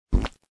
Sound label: wrong; Memory games; Algebra finding missing values